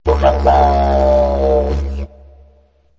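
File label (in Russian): диджериду мелодия короткая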